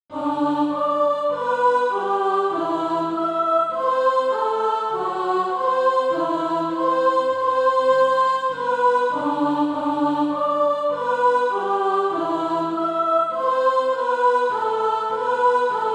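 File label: soprano, SATB Practise Music; Cleator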